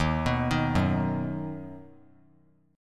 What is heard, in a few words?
D sharp Minor Sharp 5th D sharp down; arpeggiated; D#m#5; JGuitar